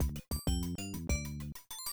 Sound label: Give Item; Flashback; IBM PC AT